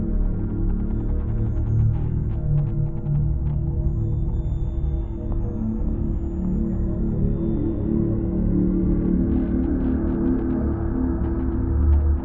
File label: ship military station idle; stations; Stellaris; toxoids